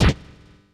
Small Sword Hit; Super Smash Bros

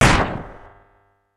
Super Hit; Super Smash Bros

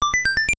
1up; super mario bros 2 nes

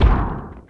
Bowser Foot Step

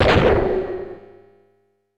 Gun Fingers; Master Hand; Super Smash Bros

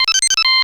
Red Coin All; New Super Mario Bros. Wii

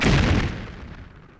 Bobomb

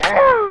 boss hit; Gnat Attack; Super Mario Paint

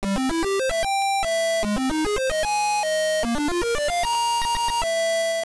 stage clear; super mario bros

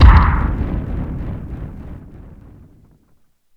POW Block

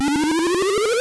powerup; Super Mario Land

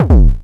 Ground Pound; New Super Mario Bros. Wii

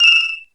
Coin Battle Star Coins Counting; New Super Mario Bros. Wii

